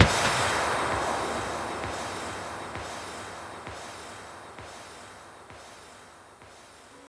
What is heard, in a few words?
FX Downlifter